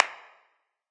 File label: clap; smite; hera